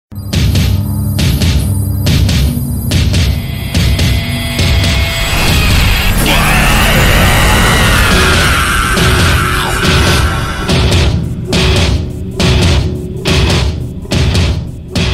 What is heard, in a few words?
attitude bgm; singam 3